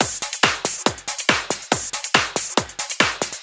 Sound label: Addon Loop, Drum Loops 140bpm, Perc Loops